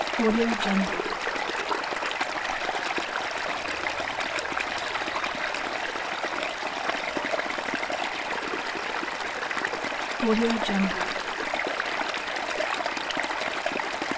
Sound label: river